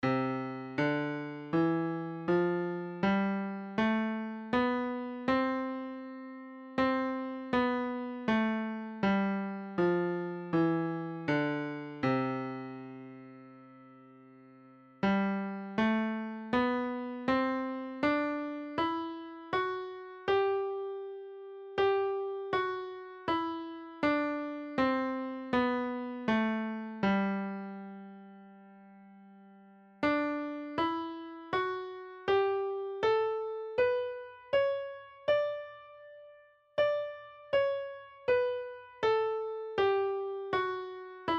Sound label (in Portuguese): Escala Diatonica CGD